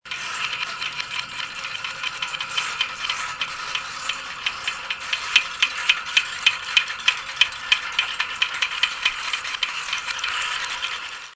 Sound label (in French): portail